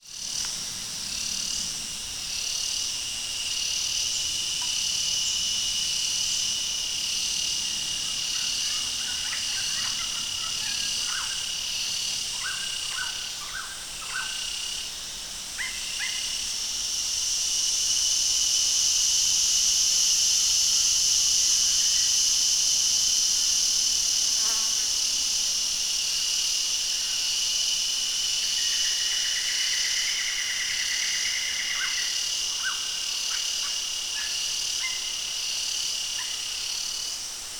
nature ambience forest australia birds insects daytime summer